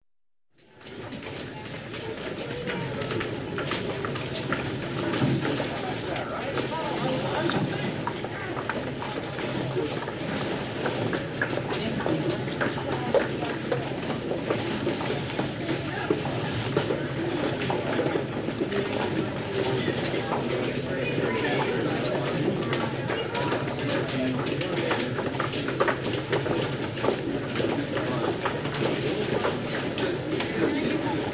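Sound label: crowd passers-by people walk along a corridor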